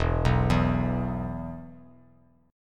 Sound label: E 5th; arpeggiated; JGuitar